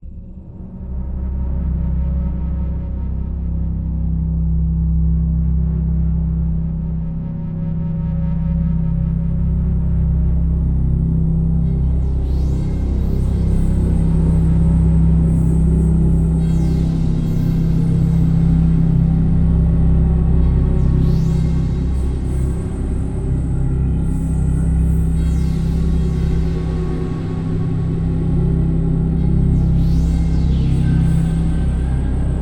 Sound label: Death Drone